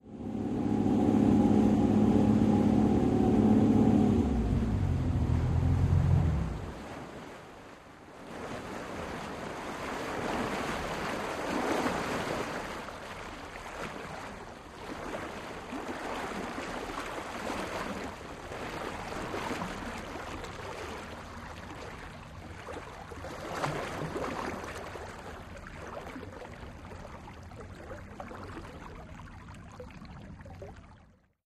Military Pbr: Onboard, Steady, Engine Cuts Off And Water Laps Hits Boats. Rear Perspective. Stereo